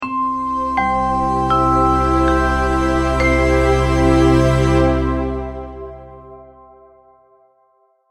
multimedia logo, Musical Identity/Signature; Short Musical Signature / Ident; Sonic Logo; Sound Design; McKinney Sound

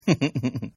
Giggle Male; Laughter; Partners In Rhyme

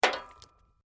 Metal Impact Metal Sign Hit By Rock; Muted, muffled metal hit sound as stone impacts with metal plate; Lynne Music

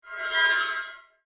Gear Movement Alien, Metal, Squeak, Device; Robot; BlastWave

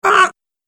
Goblin Rude, monster; horror

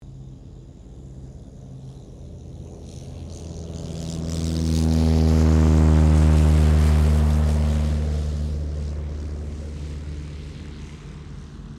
AIRCRAFT PIPER PA-20 PACER TAKEOFF; 1952 Piper Pacer; Airplanes; Vehicles; Aircraft Collection; Twistedtracks

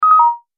Multimedia Event Notification, beep sound effect; Beep Sound Effects

